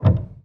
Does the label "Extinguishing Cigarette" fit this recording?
No